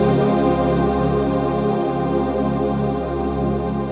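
122 BPM Pads G major F minor Tonic C, ambient synth pads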